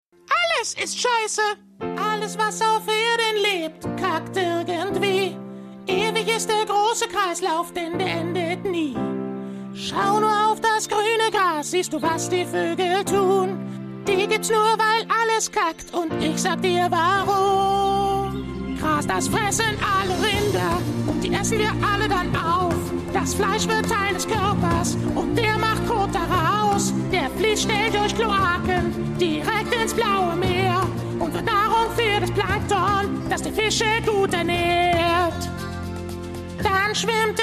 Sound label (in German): Gesang